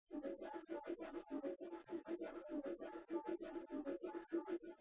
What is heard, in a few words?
Bongo